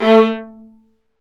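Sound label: Marcato, Strings; Vlas Marcato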